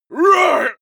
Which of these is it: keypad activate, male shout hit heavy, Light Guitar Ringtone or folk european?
male shout hit heavy